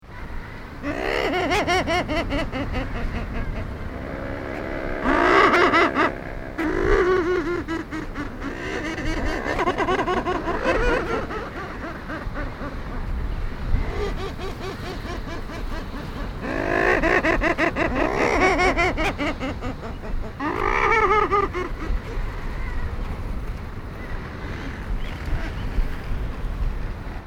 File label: puffin